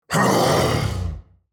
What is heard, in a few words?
Demon king lord short shout